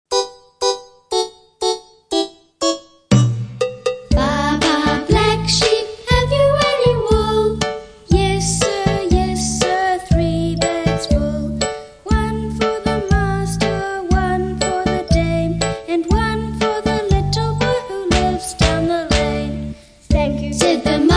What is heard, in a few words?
Baa Baa Black Sheep